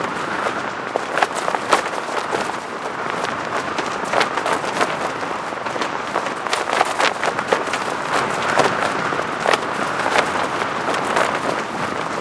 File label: cloth flag wind